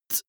beatbox hi-hat

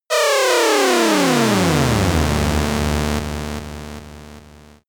synthetic beam loading

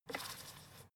fader plastic stutter soft short

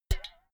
filled can hit bounce ringing dripping

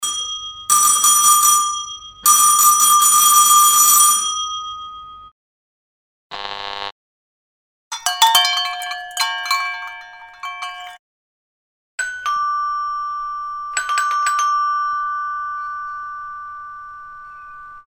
housebell mix

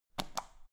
power button turn on off hard quick

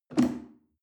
chair wood take medium

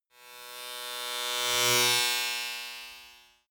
servo small whoosh

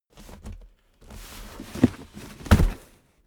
cardboard box big put down

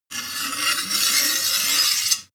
sword blade scrape long

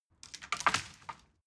wood limb break

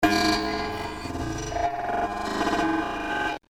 kybernetic link

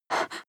breath female short

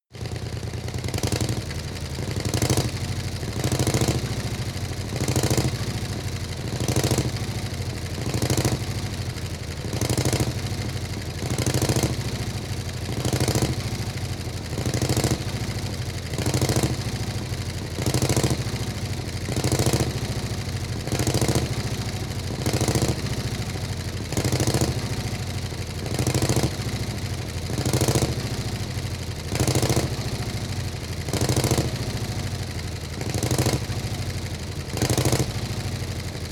motorcycle harley engine short thrusts sequence

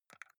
console controller xbox arrow key press fast

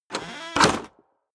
chest wood small close

